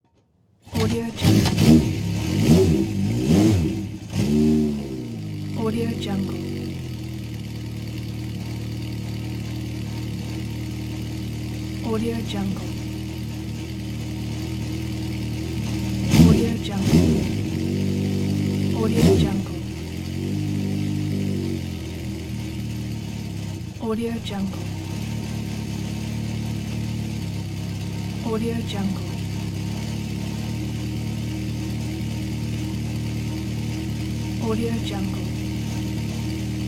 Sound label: motorcycle 900cc idle and revving